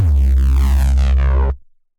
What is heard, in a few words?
Reese, Bass; Ableton; Skitter and Step